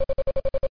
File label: Mover